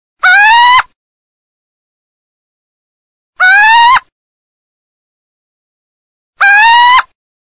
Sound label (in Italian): CIVETTA, birdsound